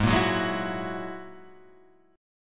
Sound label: G sharp 7th Suspended 2nd Sharp 5th; G#7sus2#5; strummed; x,x,6,3,6,3,6,3; JGuitar